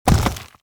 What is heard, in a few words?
stone Door